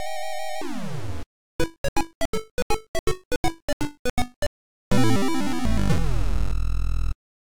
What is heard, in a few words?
Game Over; Alter Ego